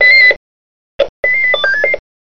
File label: Beep up and down